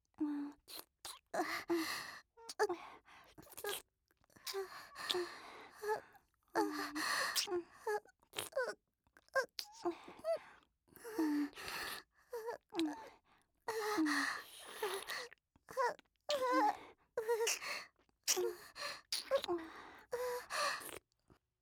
お姉さんが僕に耳舐め

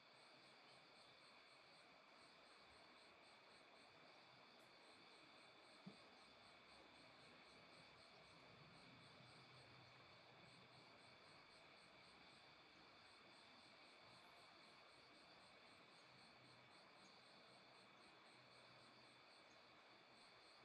反転 効果音だけ, ASMR; トラック05の効果音だけ

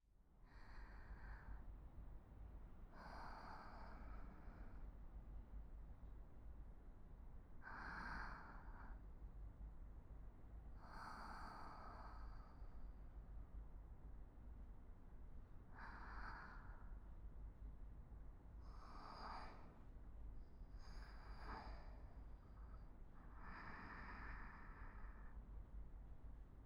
特典 寝息（右）

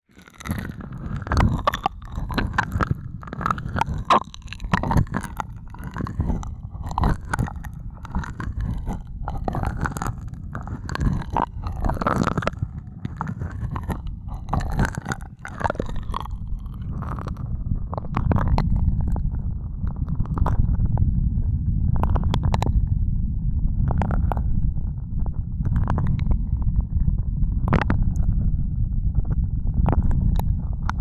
ASMR, ぐっぽりMIX

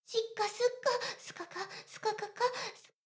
シコシコ音声; ダメなメイド

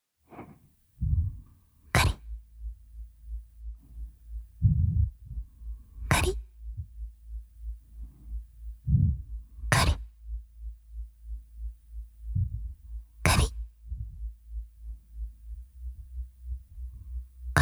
フリー乳首弄りループ音 かり…かり…, ASMR

